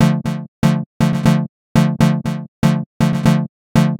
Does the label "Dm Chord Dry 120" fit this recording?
Yes